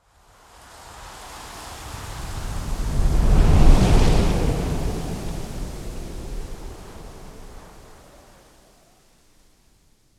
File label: Desert strong wind blow sand and dust; nature